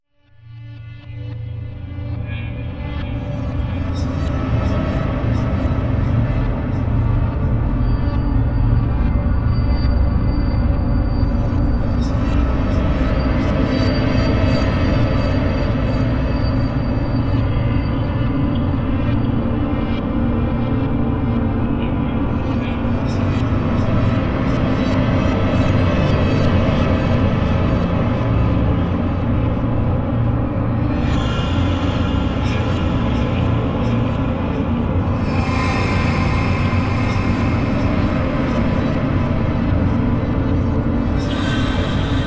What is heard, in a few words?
Granular Texture Gm 65Bpm; Ghosthack; Undercurrent